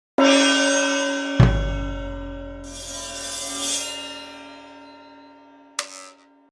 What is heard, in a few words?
gong